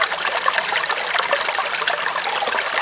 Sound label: screek